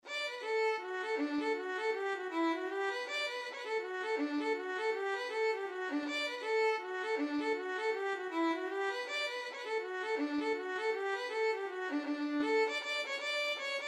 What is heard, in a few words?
violin; cameronian